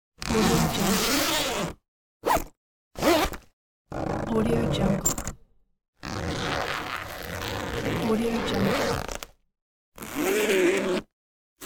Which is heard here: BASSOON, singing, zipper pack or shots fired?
zipper pack